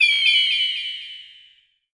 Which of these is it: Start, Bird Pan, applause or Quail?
Bird Pan